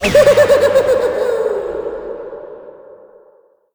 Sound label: leprechaun steal; Crypt of the NecroDancer